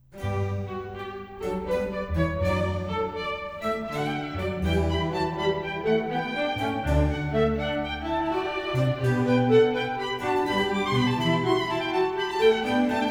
Variation 24 Canone all'Ottava, Goldberg Variations for strings; Bach; Sitkovetsky; NES Orch